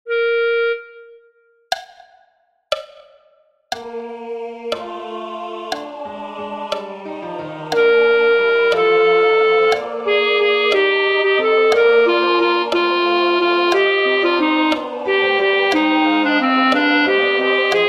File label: Sanctus Alto; Haydn; Missa Brevis Sancti Joannes de Deo